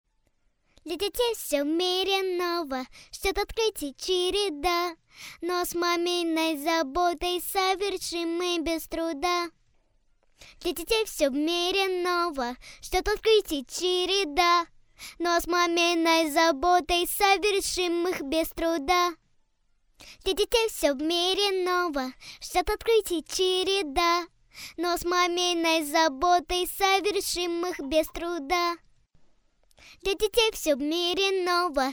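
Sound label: vocal; barhim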